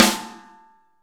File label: SN ambient; Best Service; Real Mega Drums VOL-1